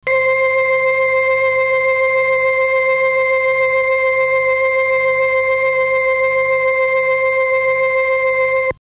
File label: organ